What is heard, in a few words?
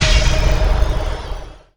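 synth, Production Effects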